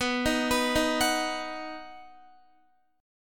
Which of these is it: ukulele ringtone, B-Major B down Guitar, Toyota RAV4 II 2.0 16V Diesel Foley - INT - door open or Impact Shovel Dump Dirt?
B-Major B down Guitar